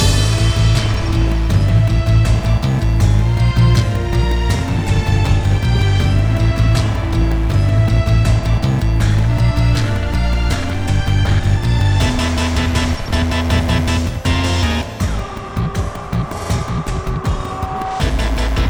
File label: Unstable Boss Prelude; rive wreck hack die retry; ps4